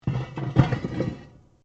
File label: METAL ON METAL LARGE SCRAPE; Metal (Sound Effects:Materials)